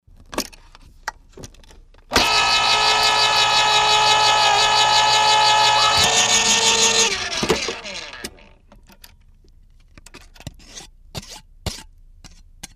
Can Opener Opn Can Scr, appliances

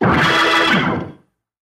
Music Effect Bluesy, organ

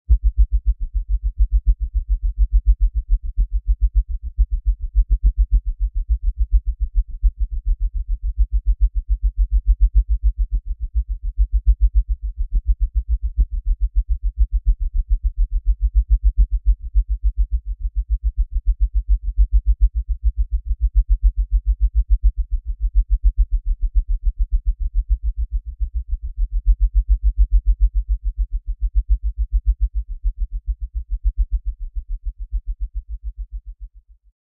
Bed Heli Fluttering L

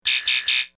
Computer Warning Bee, beeps & tones

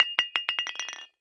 Light Bulb Drop No Break

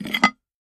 Plate Pull Off Stack; eating

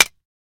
Mouse Trap Quick

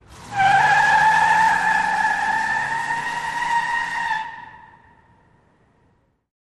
Car Peel Out Quick

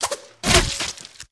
Beheading Sword, whooshes & swishes

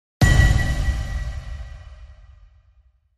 Impact Lfe Metallic

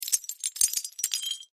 Wood Tile Sml Squares, debris

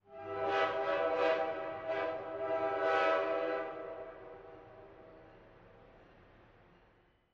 Train Horn Freight

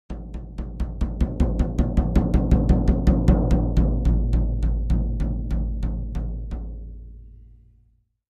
Drums Toms C Low Slw Do

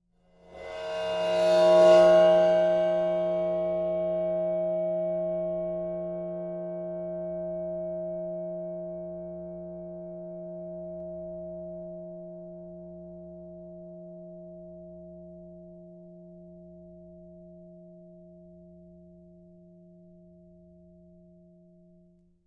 Ride Cymbal Bow, cymbal & gong; Old Ride Cymbol Bow CU 9

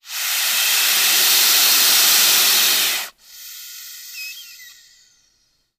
Boa, Albino Hiss. Close Perspective.

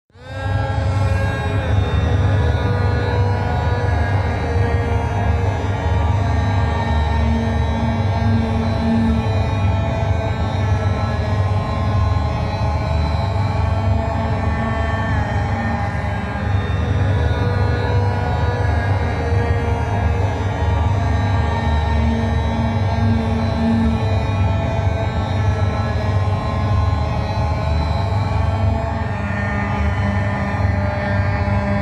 Music Space Alien Space, musical

